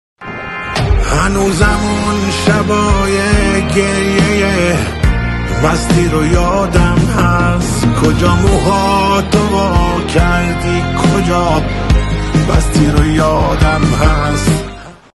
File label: Ringtone